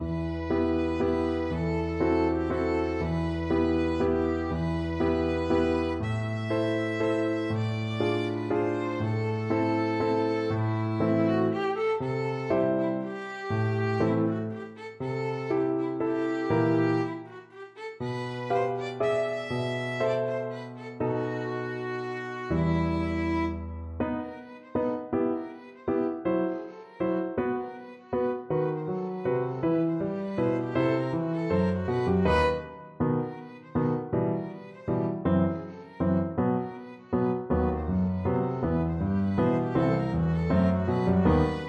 waltz, violin

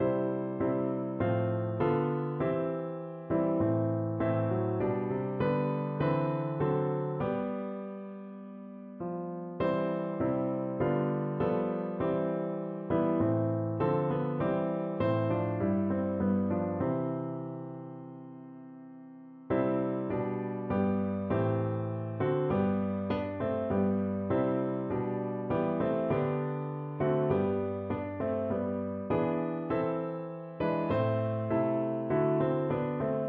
all things bright, piano

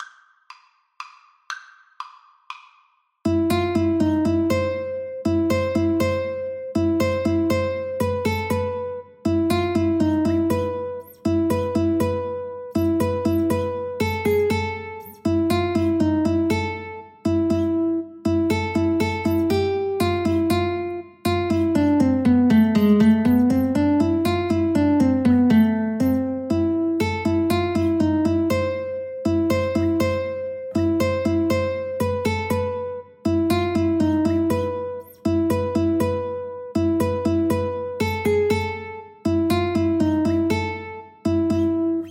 Guitar Duet

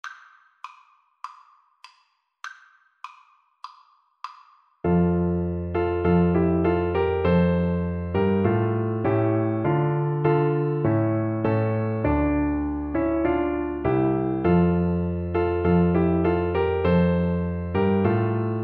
ukraine national, Flute

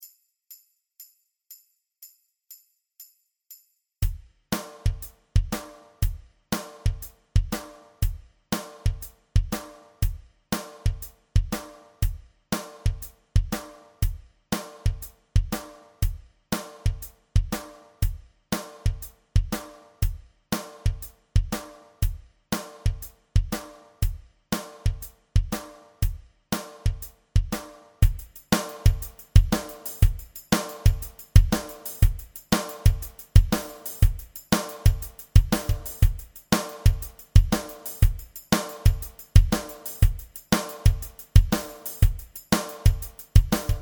Bass Guitar

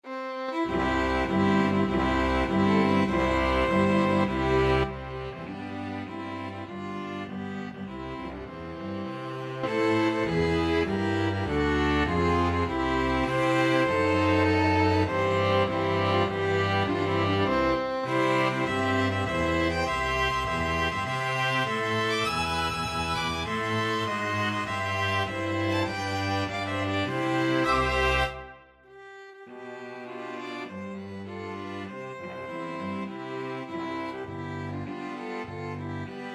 mexico, string ensemble

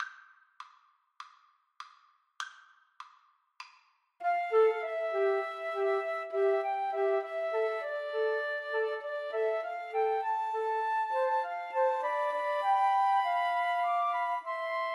chabrier idylle flute trio

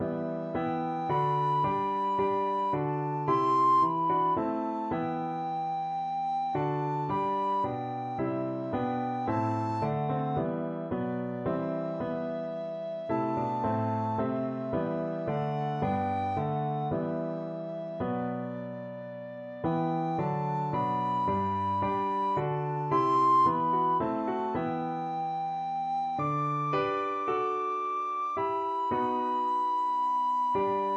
recorder

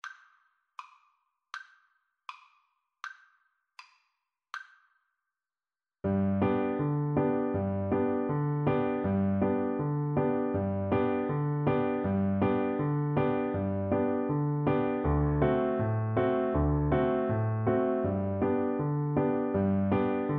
Coming round the mountain for Tuba

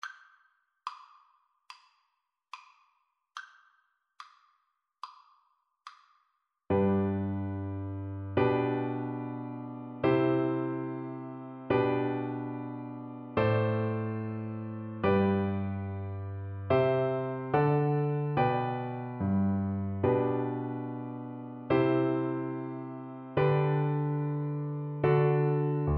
twinkle twinkle, oboe

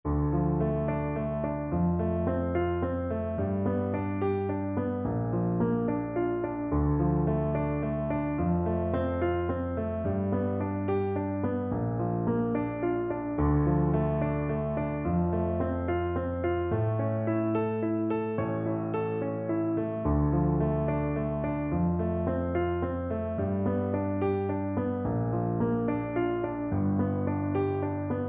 house of the rising sun, Tuba

